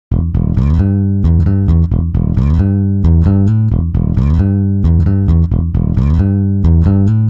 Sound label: bass 75; digidesign pro tools ts; timestretch